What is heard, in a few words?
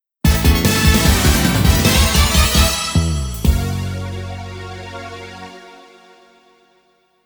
you lose; Fighting EX Layer